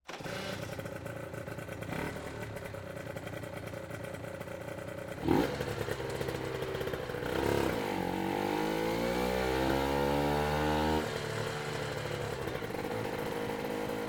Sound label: Aprilia RS 125 ONBOARD Dyno Turn On Start Ramp Long Rev Up And Down Stop Shutoff, 1998 Sport Bike; ENGINE; Dramatic Cat